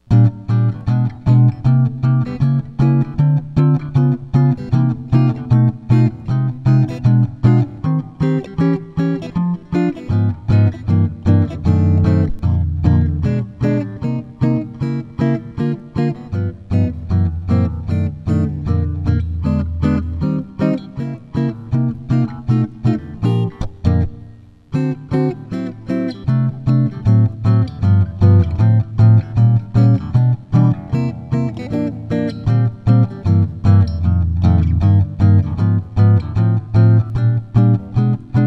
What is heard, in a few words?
Rhythm Changes Comp, jazz tunes; instructional materials